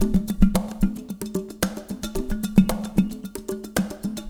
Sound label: AMB; Spectrasonics; Liquid Grooves 1 & 2